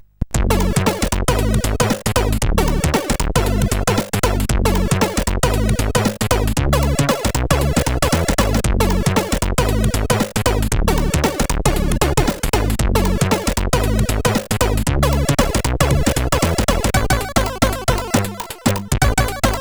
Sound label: Camel Funk, CSG8580R5